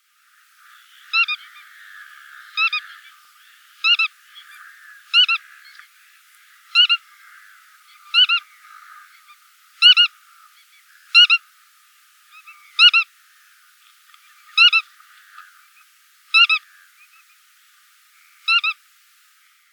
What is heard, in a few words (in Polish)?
szlamnik zwyczajny Limosa lapponica